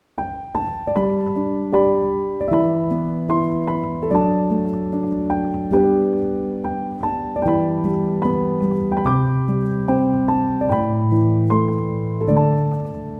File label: Piano Version; When Marnie Was There